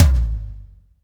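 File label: tom, real mega drums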